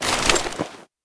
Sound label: glock draw; weapons; kuilu